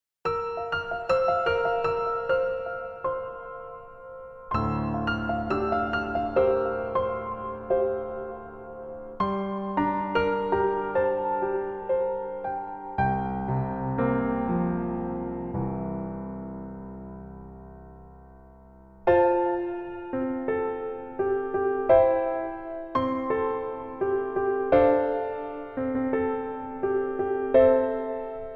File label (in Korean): Piano ver, 멜로디MR